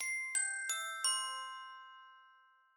Chime Announce

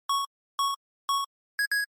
NES Racing Countdown

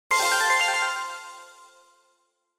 Bingo Machine Digital Result Dry

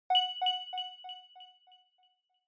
Inspiration High Delay; Onoma

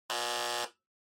Flip Clock Buzzer 60Hz

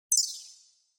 Cyber

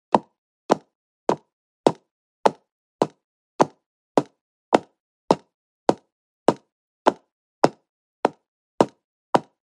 Footsteps

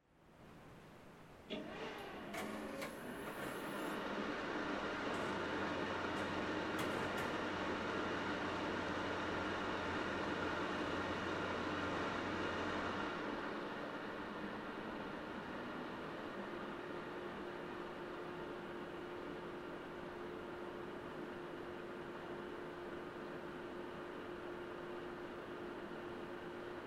PC Operating Noise Far On Off

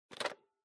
Camera Instant Hold

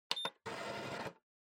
Time Recorder, Button

